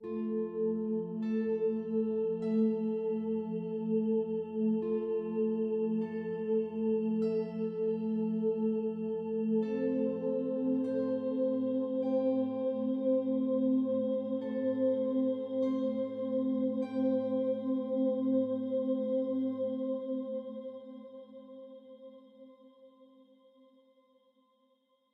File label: Plaza Loop; solo islands of the heart switch 2019